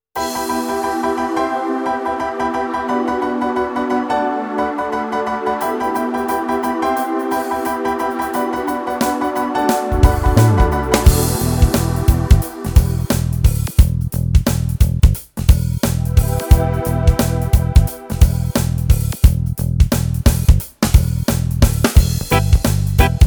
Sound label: Modern Reggae